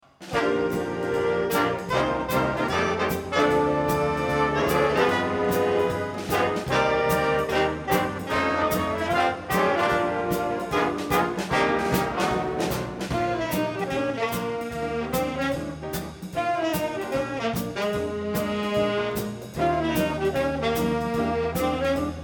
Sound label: blues